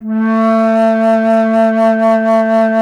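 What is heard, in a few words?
flute, alto flute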